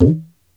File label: gumki, mrid